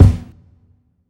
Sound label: Kick